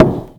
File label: Thud Pint Glass Beer Down On Table Wood Knock Door